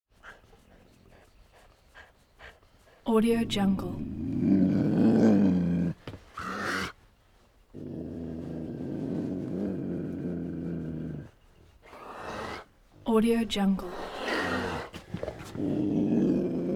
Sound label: mountain lion puma big cat growls; prosoundfx